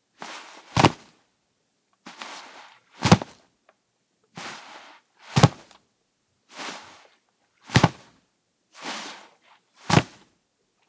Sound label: Tablet To Pocket; Phone; Joshua Reinhardt; The Ultimate Phone Bundle